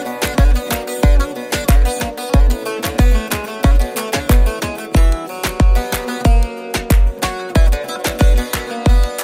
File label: Instrumental Ringtone